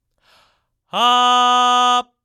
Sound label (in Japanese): のどの奥に息を当ててから発声